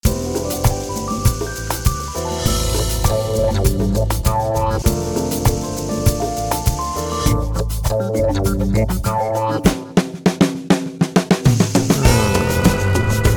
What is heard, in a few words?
Smooth Practical Joker Groove; JoosTVD; For Your Pleasure Vol1